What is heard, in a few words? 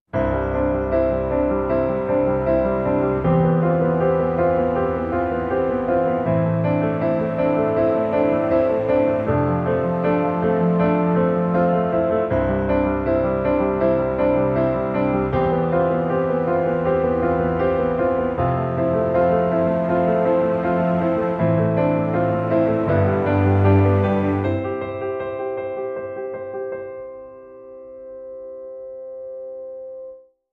Piano and Strings